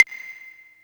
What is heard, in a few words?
Claves, Yamaha PSR E305